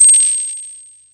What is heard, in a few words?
crystal drop